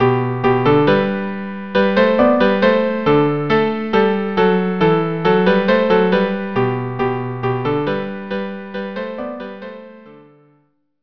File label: ronde saltarella